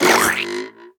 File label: Sponge Dunk Jump